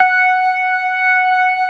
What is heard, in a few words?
ORGAN COMBOS; Keith Emerson Signature Series